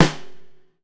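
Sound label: SNARE, Steel Percussion; E-MU Producer Series Vol. 7 – Old World Instruments; World Combos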